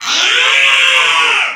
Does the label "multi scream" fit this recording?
Yes